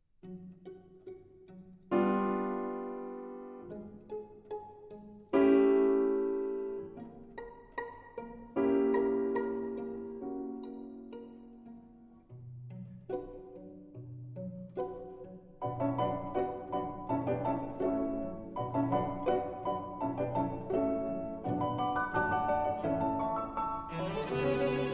Piano Trio in G Major, Scherzo. Moderato con allegro; Debussy